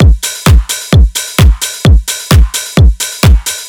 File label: Soma Beat